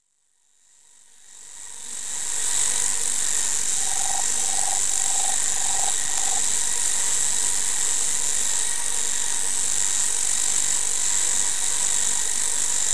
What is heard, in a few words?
synth bugs; RTcmix FMINST. instrument